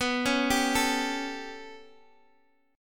B 7th Suspended 2nd Sharp 5th down Guitar Open D, chord